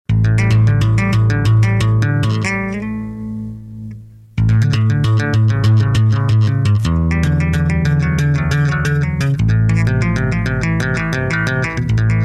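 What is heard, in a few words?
Solo on bass guitar tapping slap; Bas-gitare tepping slep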